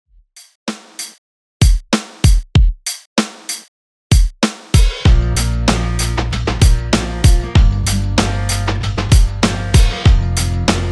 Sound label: Alternative Rock redrum